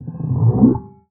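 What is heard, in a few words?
glissando, special fx; Xsample VOL-1; 90 s Sample CDs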